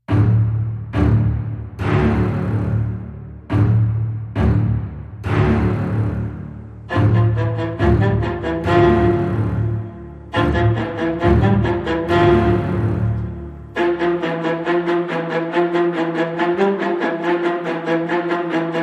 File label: Strings Only